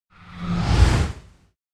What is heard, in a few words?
Whoosh Fast Fire Ball By; Hollywood Edge; sound designer's toolkit v2